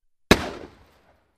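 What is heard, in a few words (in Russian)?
звук ракетницы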